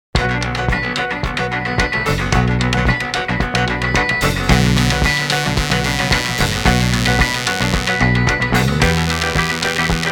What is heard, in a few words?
Instrumental